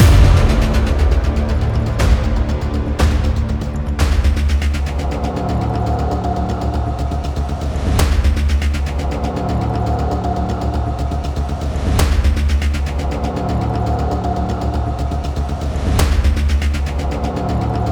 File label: tension; By Burak Sound Bank; tension gerilim vol 22